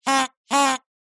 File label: cartoon honk horn or whistle